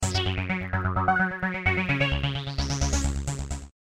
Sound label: Keyboard